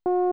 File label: beep; Perfect Dark; n64